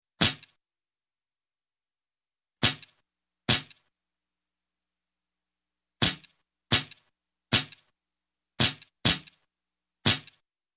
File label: Gun, Hand Gun .22 Cal With Silencer Single Shot; Sound Ideas 2000 Series